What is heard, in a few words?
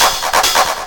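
HH clap loop, big beat; Big Beat Samples & More; AKAI; HHMIDFX KIT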